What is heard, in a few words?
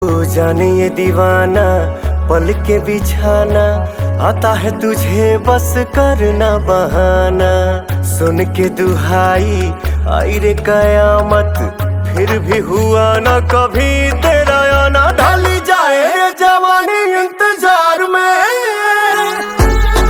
Ringtone; stree 2